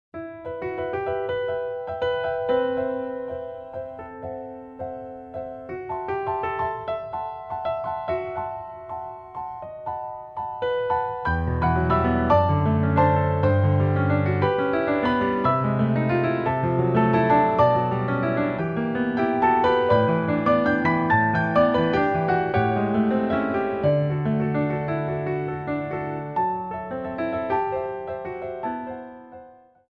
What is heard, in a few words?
Agitato; Romanza sin palabras